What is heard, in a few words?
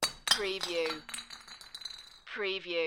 Glass Bottle Drop Bounce